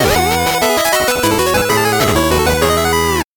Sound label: 8bit jingle fast